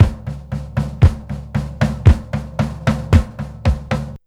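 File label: DANCE BEAT; USB Soundscan vol.32; AKAI; Roots Disco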